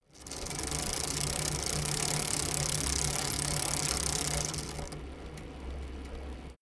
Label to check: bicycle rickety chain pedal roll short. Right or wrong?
Right